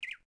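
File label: ruins ambience; poolofradiance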